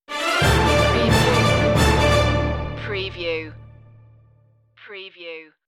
stinger

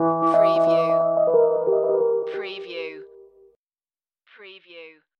Hip Hop Dark Rhodes Licks 90 BPM Swing - E

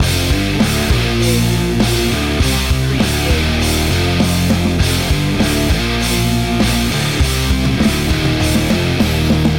loop

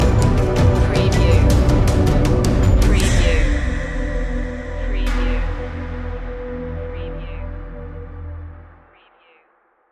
forest fantasy stinger

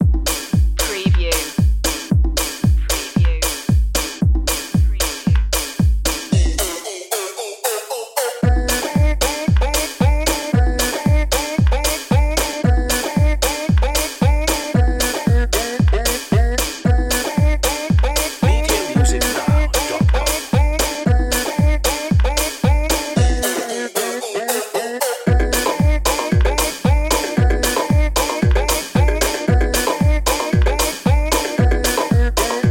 crazy bird 114bpm

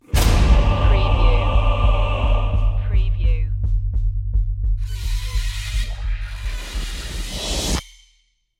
the dark path horror stab

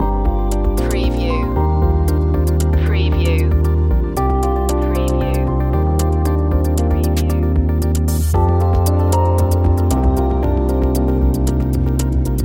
distance arriving loop

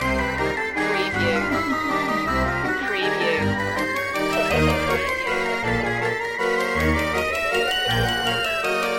freak show loop

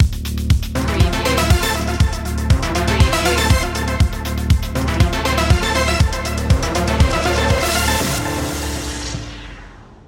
arp stinger

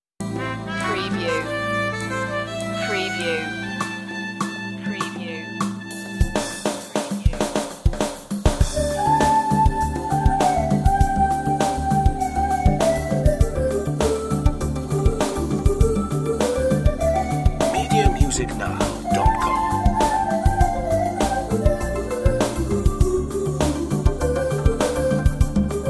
fusion break

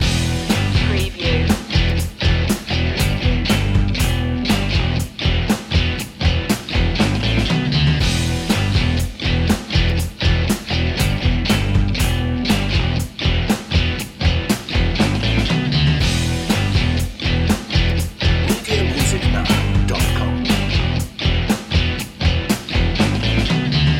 bikers bar loop

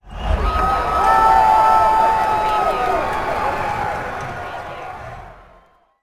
Basketball Pro Crowd Cheering

